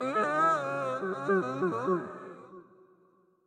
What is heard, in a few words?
Toxic Mask Knocked Down